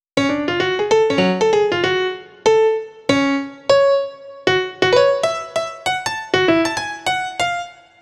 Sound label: Piano Solo, Cinematic Funk; Sony Creative Software; Urban Tone Poems; Solos